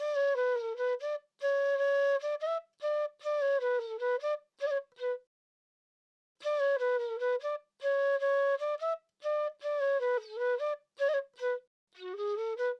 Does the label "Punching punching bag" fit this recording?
No